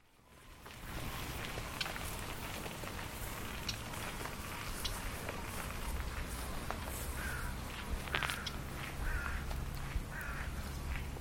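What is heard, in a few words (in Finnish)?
ruokokerttusen varoitus; linnut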